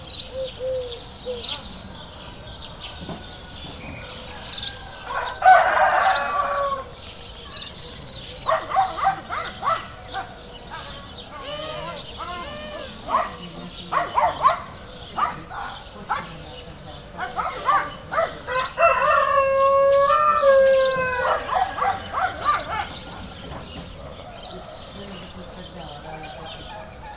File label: country side habitat - roosters dogs and birds distant; soundscapes